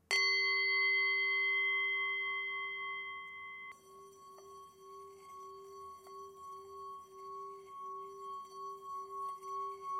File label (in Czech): tibetska misa; Pemba malá